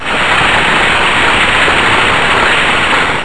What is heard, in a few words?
RUSHING, NATURE